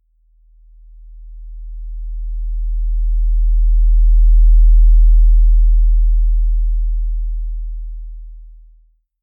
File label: 40Hz tone